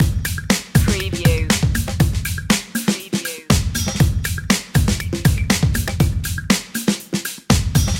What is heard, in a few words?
energy funky drums loop